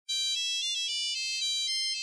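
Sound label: sms sound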